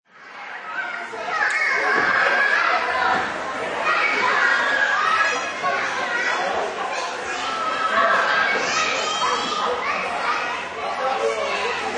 Childrens Indoor Playground, Noisy children playing and shout; Children´s indoor playground. Noisy children playing and shouting.; Playgrounds